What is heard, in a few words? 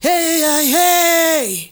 MALE SOLO, Heart of Africa